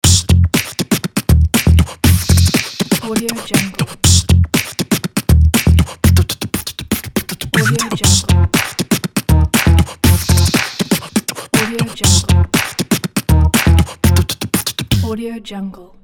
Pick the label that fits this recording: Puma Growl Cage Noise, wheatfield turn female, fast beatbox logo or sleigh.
fast beatbox logo